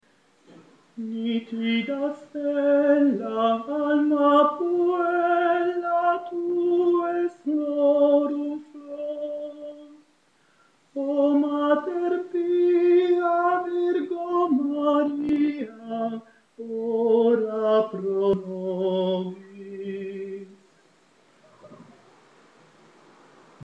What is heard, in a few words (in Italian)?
Tenori; Nitida Stella